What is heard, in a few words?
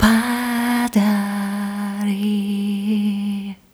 Rhythmic G